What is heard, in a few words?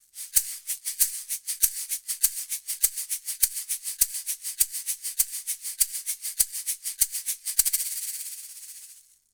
TM-60 Shaker; tascam